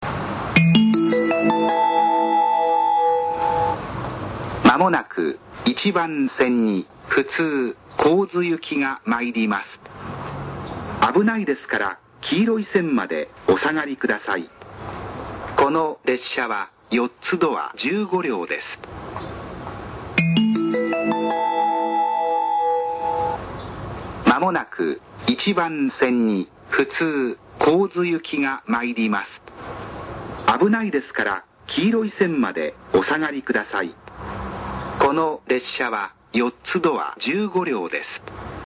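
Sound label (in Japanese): tsujido sekkin, approach melody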